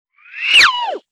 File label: Wind Whistle Swoosh; Vintage Cartoon; Musical Elements